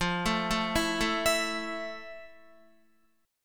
F Major 7th Guitar; arpeggiated; JGuitar

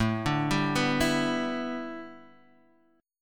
A 9th Suspended 4th Guitar DADGAD, chord; x,0,0,0,2,2

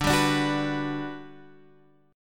G Major 7th D

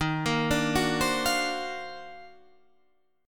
Eb Minor Major 13th, Guitar, Open D

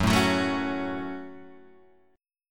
F sharp Minor F sharp, Guitar Open G

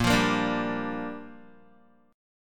Bb 6th Bb, Guitar; strummed; 6,8,8,7,7,x

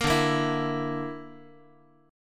D Suspended 2nd Sharp 5th D, Guitar; Dsus2#5; strummed; JGuitar

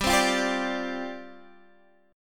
G 7th Suspended 4th G down Guitar Standard; G7sus4 strummed

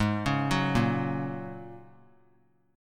Ab 7th Suspended 2nd Suspended 4th, 3C,3F,3A,3D; Ab7sus2sus4 arpeggiated; 20,20,21,20

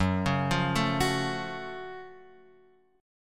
F sharp Minor Major 7th F sharp Guitar Open F; arpeggiated

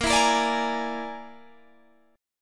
Bb 7th Flat 5th Bb, Guitar Standard; Bb7b5; strummed; JGuitar

